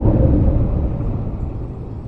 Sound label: Lightening; RYL 2